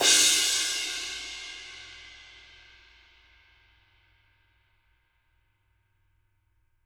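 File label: dark crash, Living Drums, JZ CRASHES; Sampleheads; Peter Erskine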